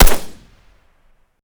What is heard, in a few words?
sks silencer, weapon; metrocraft